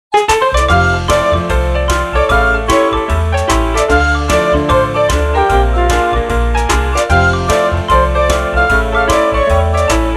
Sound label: spot jingle